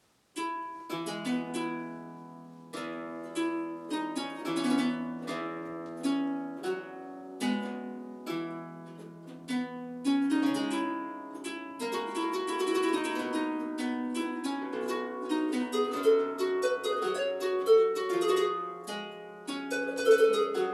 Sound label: harpsichord